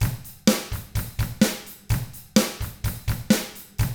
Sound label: 127 SURF ROCK